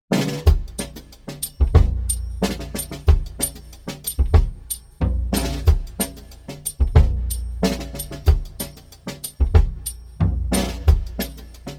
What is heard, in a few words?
intro slow, drums